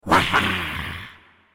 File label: spirit scream; New Yankee in King Arthurs Court